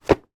household woodburner fire proof gloves down on surface